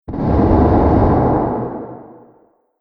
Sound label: Horror Explosive Impact; Miscellaneous Impact Sound Effects